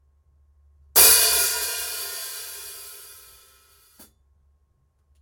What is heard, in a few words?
Zildjian Z3 Hi Hat 14 Open Hit